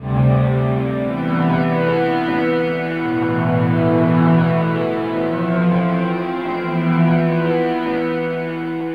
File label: Up, Elektrik Drone, Moving; Sonic Foundry (Sony Creative Software) - Friendly Fire; DroneLoop